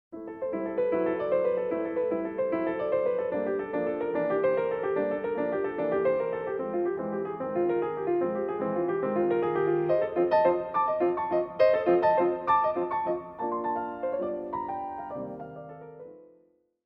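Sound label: Register, piano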